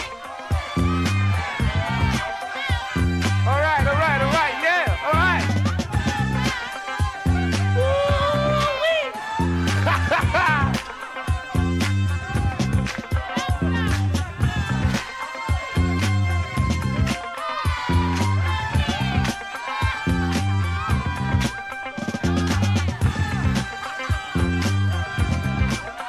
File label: boombox